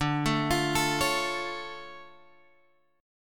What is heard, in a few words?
D Minor Major 7th, D, Guitar, Drop D